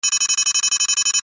Sci Fi Alarm Beeps Digital Technology Readout Loop; Control Panel